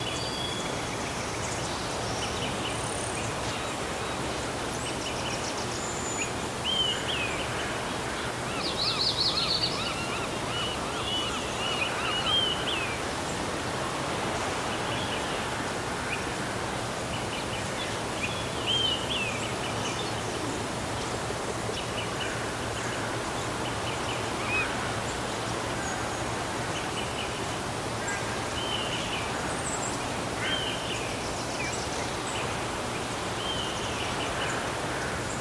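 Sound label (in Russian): успокаивающий многоголосый приятный звук пения птиц на фоне шума воды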